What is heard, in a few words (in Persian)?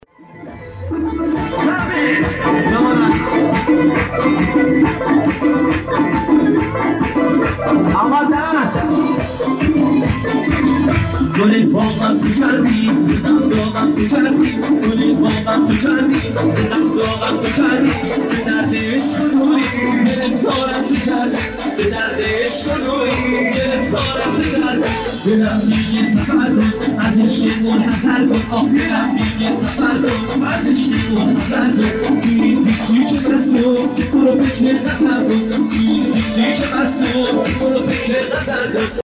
صدای جشن عروسی